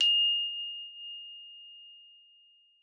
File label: F5, GLOCKENSP S; Xsample VOL-4